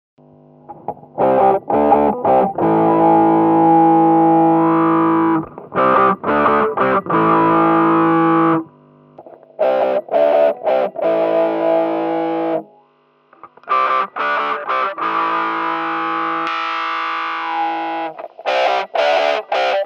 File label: Q-filter